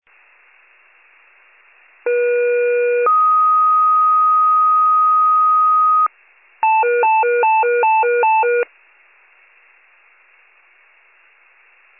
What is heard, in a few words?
FIRE pager alert